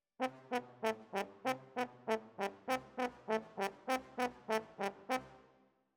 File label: Horns